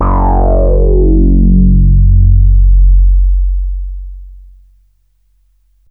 FUNKY, BASSES; Masterbits - Vintage Voltage; VV BASSES; FUNKY PAVE

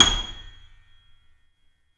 C7 F, The Ultimate Piano Collection; East West

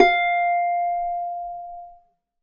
F4 HARP; USB Soundscan vol.62; AKAI

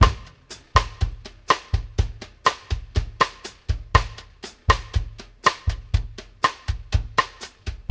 BRSH TAP SNR, BOSSA 120; Sampleheads; New York City Drumworks VOL-1; KD BOSSA 120

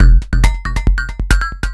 percussion loops, Techno; Best Service ProSamples vol.45 - Techno ID; PS-45

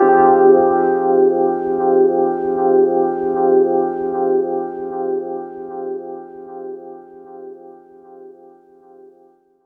Wah Pad; Zero-G Jungle Warfare 1, 2 & 3; 90 s Sample CDs

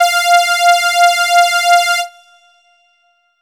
lead grind F; leads

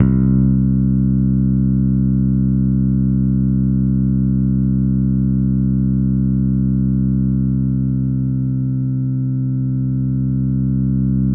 C2, SynthLine; 90 s Sample CDs; NiceBeats; SynthLine VOL-33

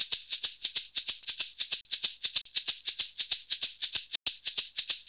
SHAKER, Modern Percussion Loops

